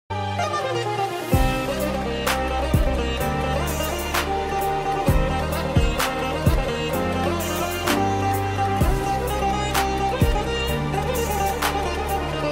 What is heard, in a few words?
veena bgm; indru netru nalai